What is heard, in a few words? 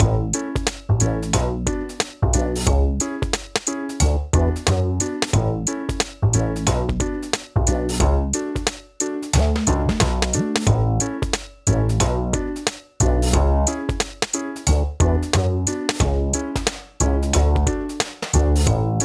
Casual Menu Piano Loop; switch windows gamerip 2023; The Legend of Gwen